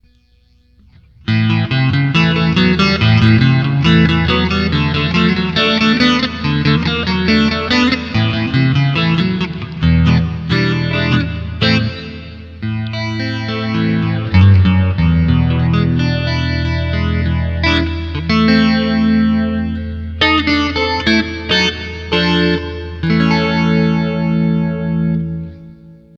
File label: Lite Phaser, Boss GT-8